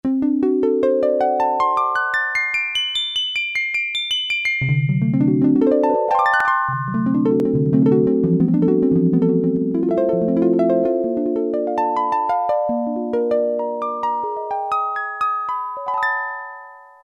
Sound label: Harp, Yamaha TX81Z